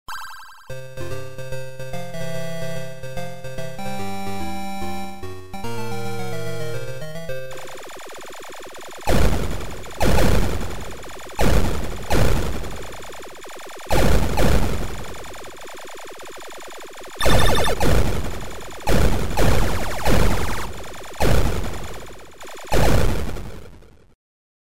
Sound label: ship